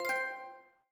Achievement Completed; Custom UI Sounds; Playstation 4

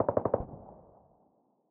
aug burst short, weapons; SPT Battle Ambience

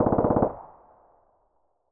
ppsh burst long, weapons; SPT Battle Ambience

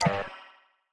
Error Message; Custom UI Sounds; The Division 1

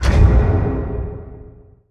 Menu Modding Open; Custom UI Sounds; Dark Souls 3

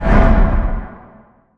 Menu Inspector Window Open; Custom UI Sounds; Elden Ring

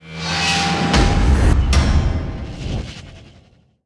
Achievement Completed; Custom UI Sounds; Dying Light 2 Stay Human

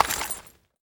Menu Install Mod; Custom UI Sounds; Vital; The Division 1

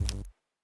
Backpack Close; Custom UI Sounds; Cyberpunk 2077

